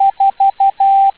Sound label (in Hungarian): Távíró 4